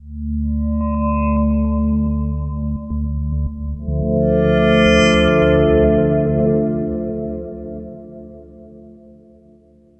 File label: Novation Peak test glass sweep